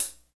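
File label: hi hat; HH-Kits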